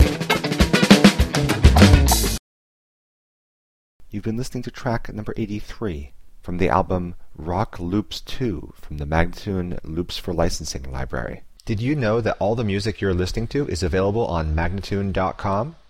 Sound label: 100 E spoken, Rock Loops